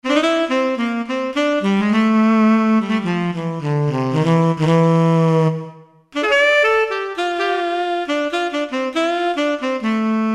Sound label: Turbo Tenor, Roland SR-JV80-01 Pop